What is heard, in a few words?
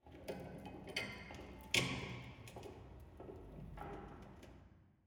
hangar layer